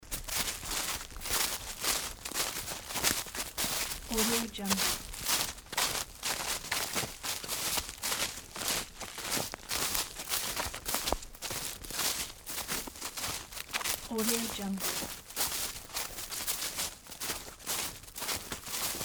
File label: two people fast walk in winter forest